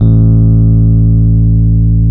electric pianos; Wizoo

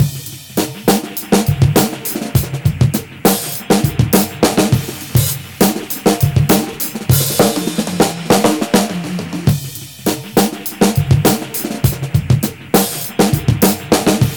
101.1 bpm; NERVOUS BREAKDOWN; 90 s Sample CDs; Planet of the Breaks

